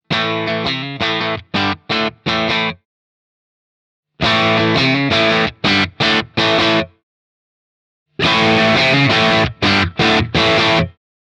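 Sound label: Gain 0 to full, guitar overdrive; Nobels ODR-Mini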